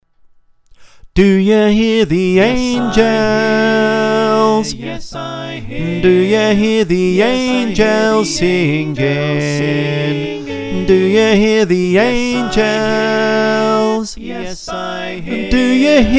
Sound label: Tenor